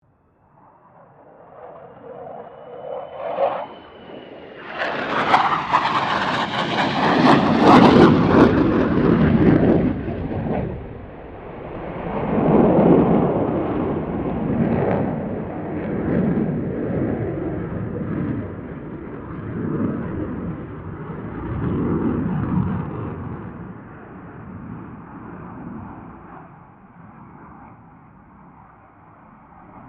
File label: AIRCRAFT FA-18 HORNET PASS